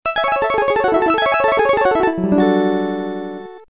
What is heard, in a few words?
jingle; snes capcom